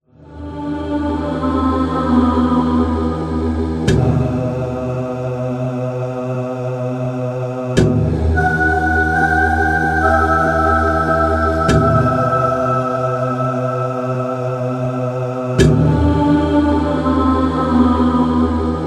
Voices in E Minor choir

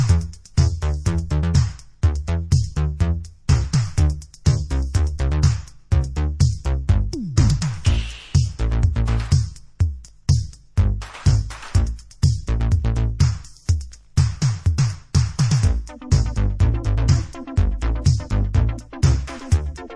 instrumental

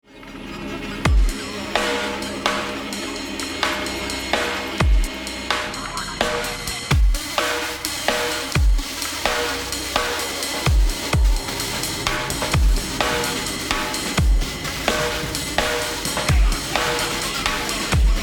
DRUM BASS REMIX

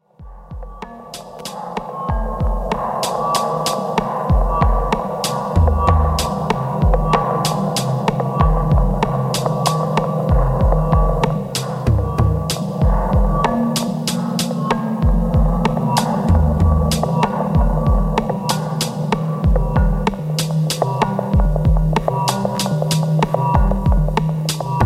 Wurli Arp

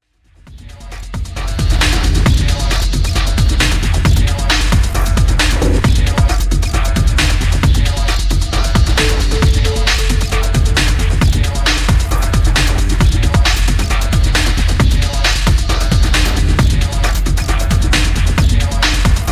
Future Atmo

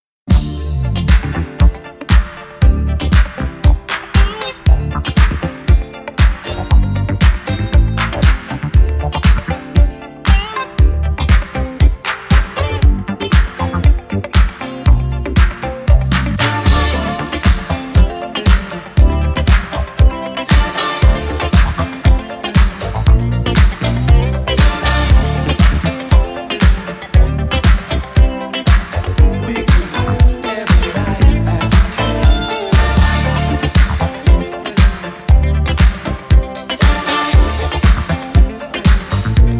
Instrumental Disco Version

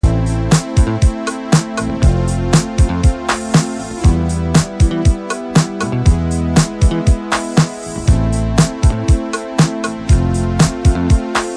Lets Go Out Instrumental

